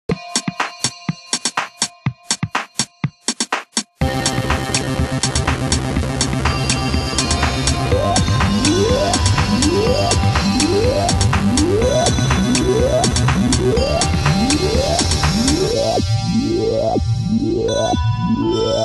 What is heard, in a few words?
Rev it up, Windows Mobile ringtones; Microsoft